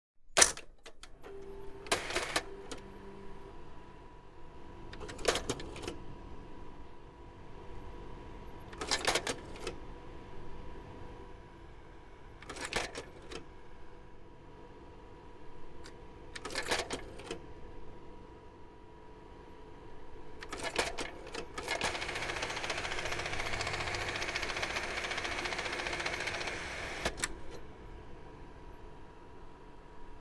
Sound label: kodak ektapro 5000